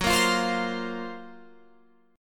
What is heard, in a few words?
Gb Major Sharp 11th, Guitar Open F; GbM#11 strummed